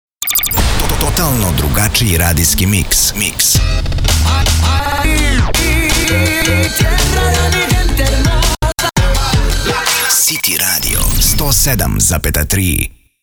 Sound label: city radio id jingle